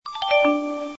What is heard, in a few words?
enter